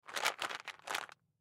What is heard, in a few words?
Pill Bottle Large Pills Shake Plastic Medical Medicine; Shaking; Foley